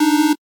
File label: Chord